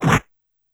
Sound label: Scratch Cut, Yamaha PSR-E323 SFX Kit